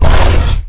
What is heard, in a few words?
Rock Throw; Evolve; Goliath